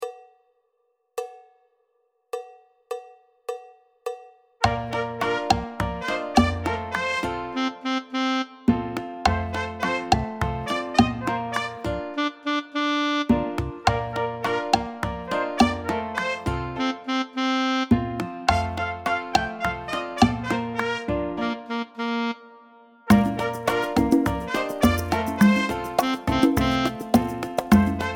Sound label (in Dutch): cha cha cha Snel